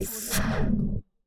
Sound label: game futuristic building upgrade sound